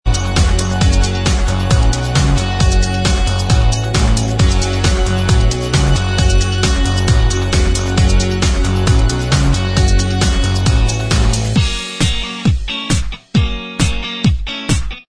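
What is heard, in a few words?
Instrumental Track, backing tracks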